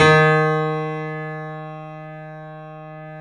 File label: Small Pianos, 3-D Audio Collection